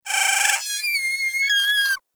Player Lure Call; Warframe; Deimos; Animal Lure; Avichaea

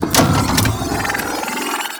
Missle Volley Charge Up; Ship Power; Warframe; RailJack

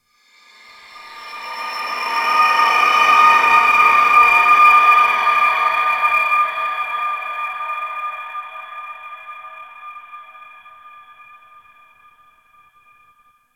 Space Exterior Dist Call, Ambience, Space Battles; Warframe

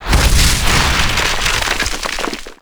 Landscape Heat Fissure Open; Gameplay; Warframe; Venus Open World

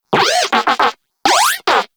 Moa Compassionate Vocal Affirmation; Warframe

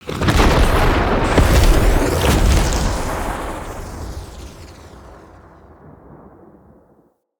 Lightning Escape; Characters; Enemy; Nimrod; Abilities

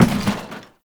Janitor Cart Impact Short; Warframe; Ambience; NineteenNinetyNine

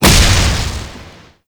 Stadium Hazard Spark Projectile Impact; Gameplay; Warframe; Nineteen Ninety Nine

